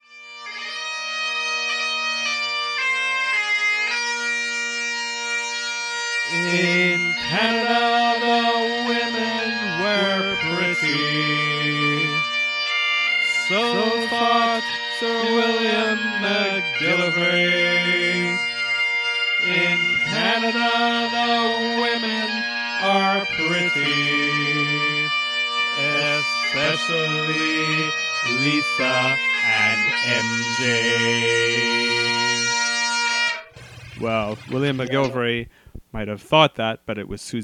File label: bagpipes parody